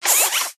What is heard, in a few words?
gunstand servo; gow; turret judgement